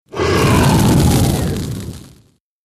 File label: Fire Breath Dragon